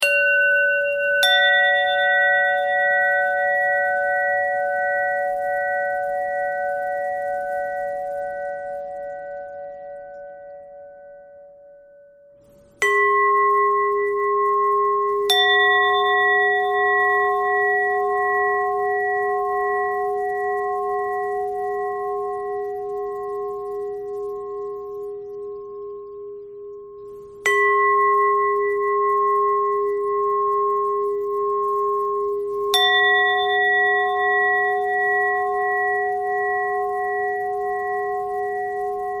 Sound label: bell bell thread noise